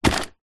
household vacuum cleaner dyson v7 stick set down carpet